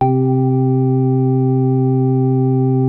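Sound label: M1 house organ; Korg M-1; Rubber Chicken Software Sample Bank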